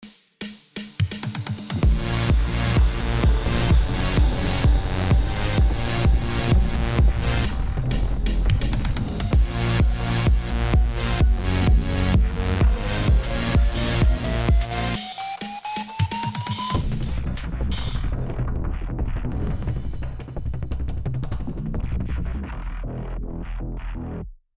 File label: edm mix